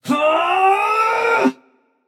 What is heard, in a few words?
battlecry; smite; xbalanque